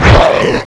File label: zombie attack; The Monster Plane; kolmods preatt; kingzombie